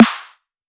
Snare 3k

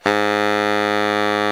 BARI FF G#1, saxophones; Greytsounds - Philip Wolfe David Crozier's Saxophones; GR8 SAXES FF

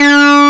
SYNCHRO; East West - Global Sounds; THE CAT

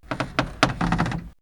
Creaky Wood; X-Static Goldmine

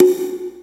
perc, Percussion; Trance; Drums; Trance Explosion Vol1

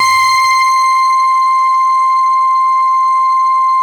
CELLU C5, Yamaha VP-1; Music Store; 90's Sample CDs; CELLULOID

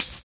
Hhat, Hihat; Equipped Music; Loungin House

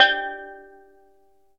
steel drum

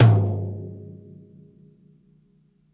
ROOMY TOM MED, DRUMS ONE SHOTS; BASEMENT DRUMS VOL 2

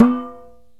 PERC KALIMBA

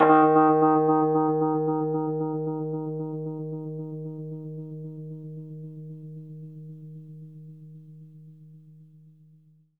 Rhodes fast D#, 3D Keys; 3D Sound; 3D Keys VOL-1

